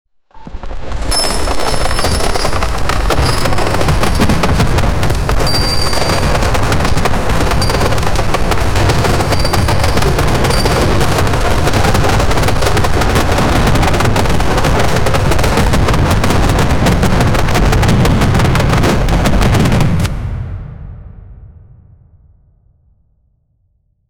produce intense battlefie, horses

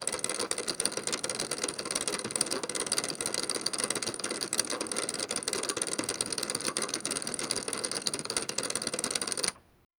i want a very short, gear

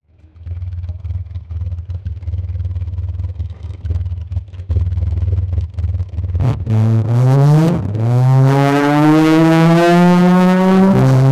make a old 70 le, race car

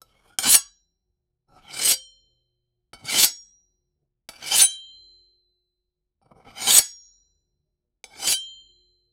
slow motion metal cutting, knife

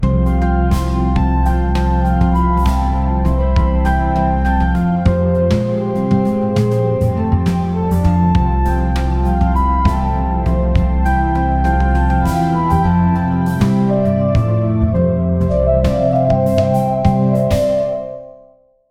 luxury music for cosmetic

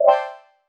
positive synth pluck, major chord

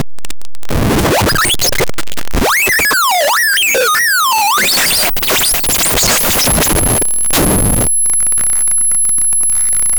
start with low whispering, gibberish

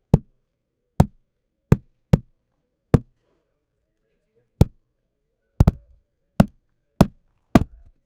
leather ball hitting leg, sport

